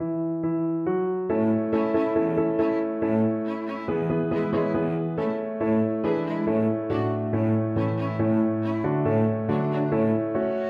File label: piano quartet; to the river